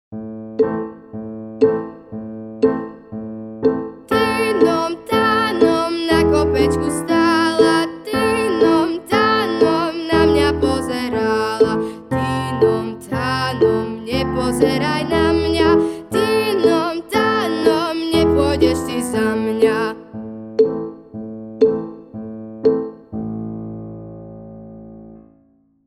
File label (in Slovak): spev